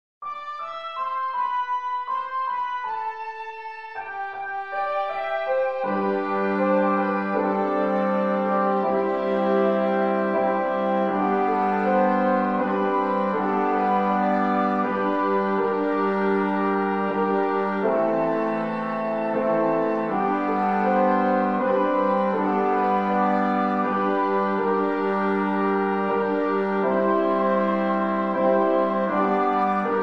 piano; four part concert band

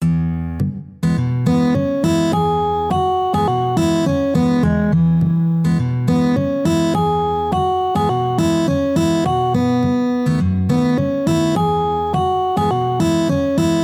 folk song

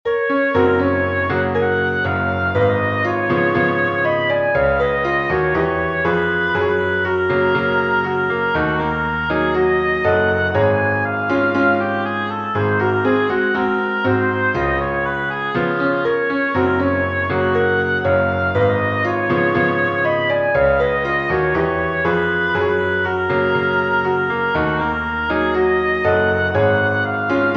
for oboe piano; cervantes ignacio gran sea ora from two cuban dances

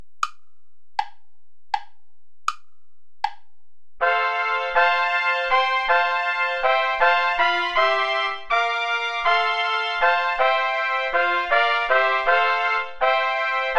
play along without trumpet

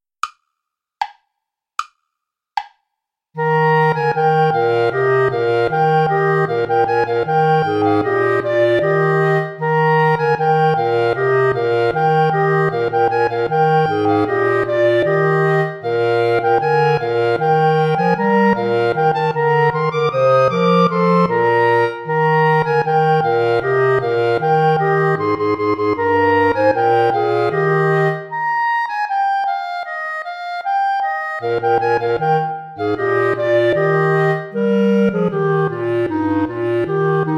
play along without clarinet; schma ckt den saal mit gra nen zweigen

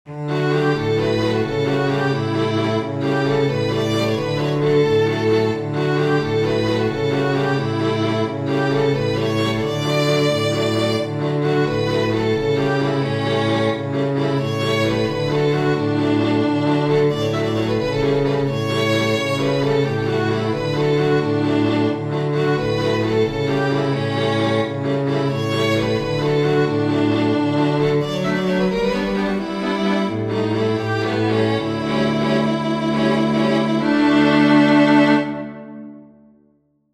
italian song for string quartet

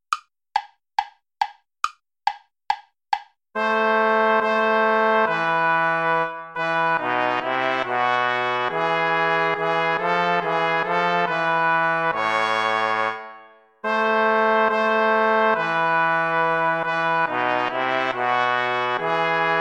allemande play along without trumpet

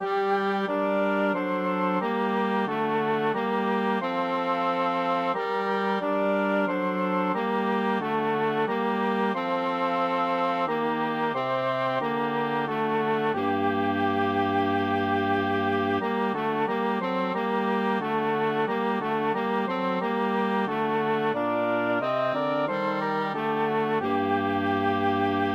for woodwind quartet; let us christians rejoice

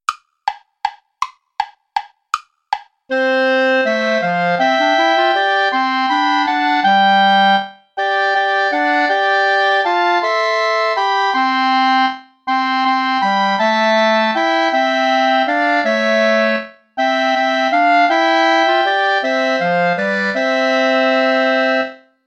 play along without clarinet; vulpius melchior; die helle sonn leucht jetzt herfa

